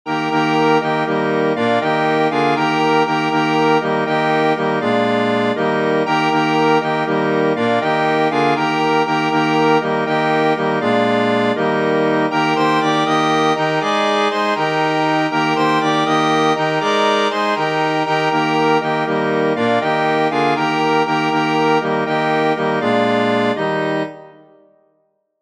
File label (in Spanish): los libros veintisiete son, Himnos Cristianos